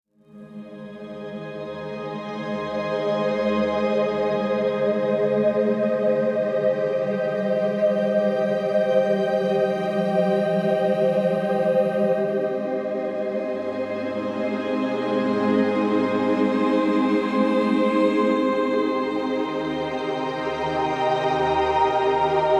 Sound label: dreamy ambient